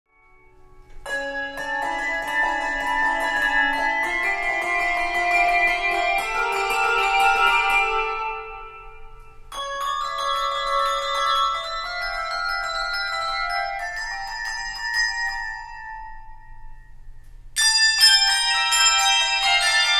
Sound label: toccata and fugue